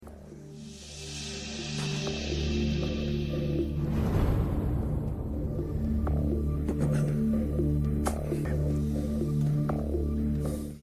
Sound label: tension largo